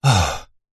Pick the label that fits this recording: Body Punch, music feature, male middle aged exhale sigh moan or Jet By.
male middle aged exhale sigh moan